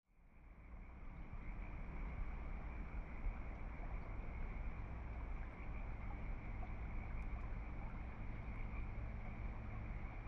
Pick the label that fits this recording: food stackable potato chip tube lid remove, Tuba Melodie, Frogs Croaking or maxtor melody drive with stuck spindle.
Frogs Croaking